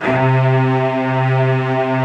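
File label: HALL STRING; Greytsounds; Scott Peers Sound Engineering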